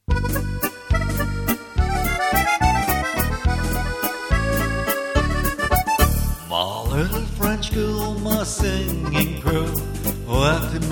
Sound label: Musette de Rock n Roll